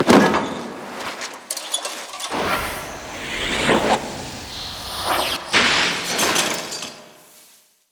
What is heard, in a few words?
emote death; LoL; thresh